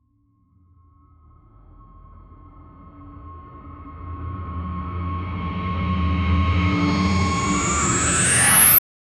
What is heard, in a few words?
Drone Ambient Riser